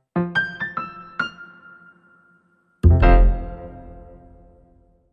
Happy Hodge Podge, Sting 1

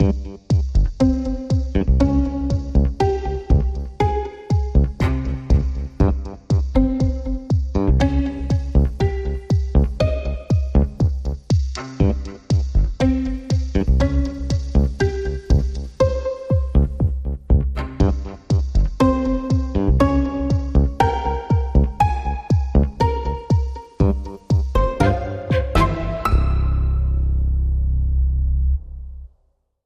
Warning Signs

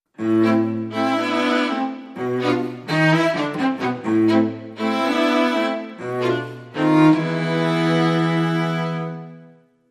Tango Sting

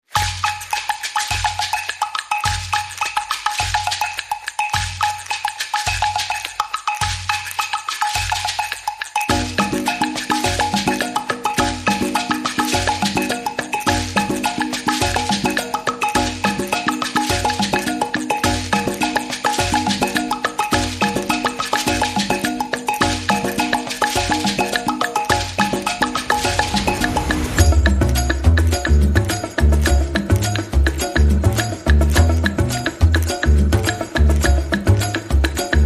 No Vocals

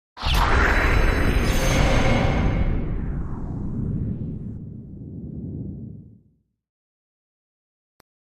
Sweep

